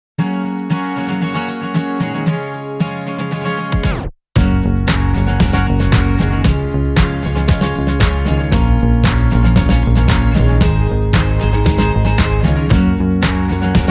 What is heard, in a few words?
Strolling Whistle, Rhythm; 30sec